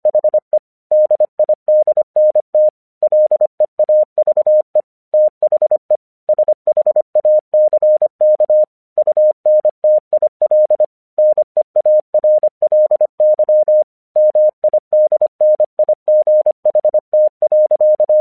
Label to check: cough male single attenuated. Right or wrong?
Wrong